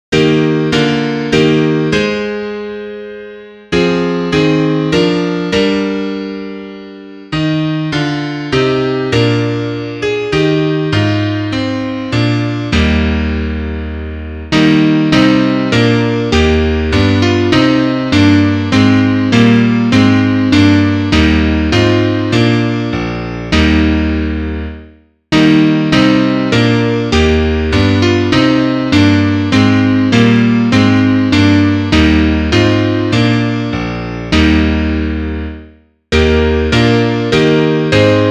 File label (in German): Klavier Solo, trompete; Lobet den Herren